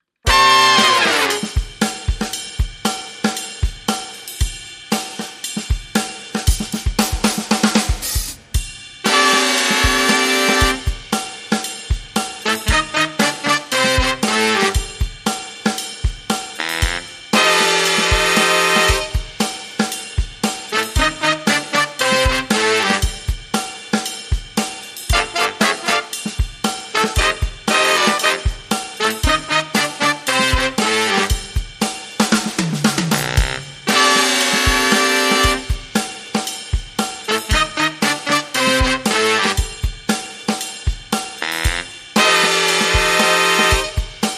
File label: Horns & Drums